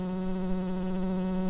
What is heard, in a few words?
bumblebees, Hypnorum workers